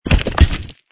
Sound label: hitted stone; croods